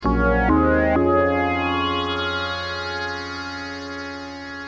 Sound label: ringtone